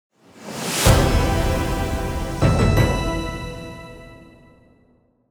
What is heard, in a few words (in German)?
Soundlogo; corporate sound; Zeppelin